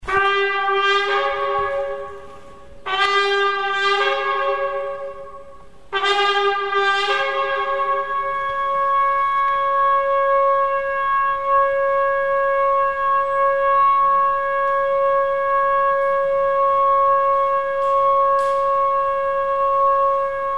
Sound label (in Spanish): toque